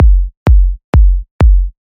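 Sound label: Kick loop 128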